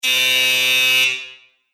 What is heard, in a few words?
Apartment Buzzer - Long Reverb; Doorbell